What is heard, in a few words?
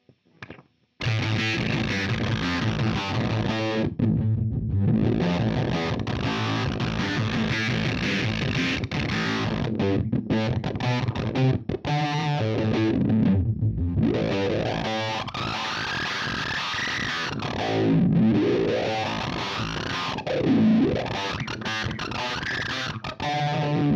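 filter freq res vintage, Filter Fuzz Effektpedal; Walrus Audio Kangra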